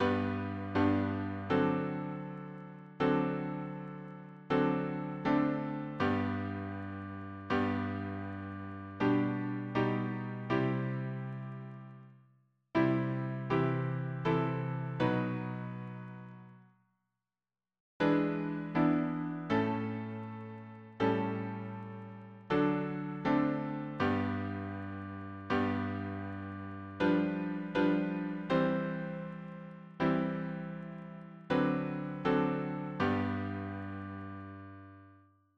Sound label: harmony piano